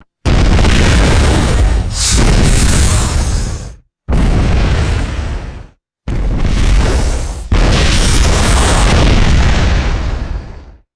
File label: three explosive air blast impacts; explosions